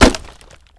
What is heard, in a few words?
wood; Mafie 1